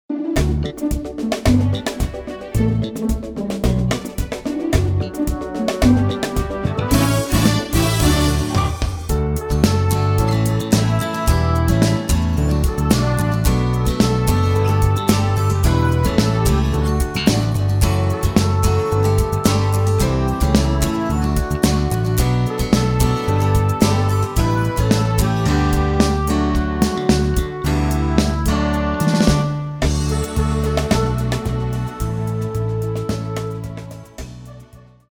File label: Be Strong Contemporary Track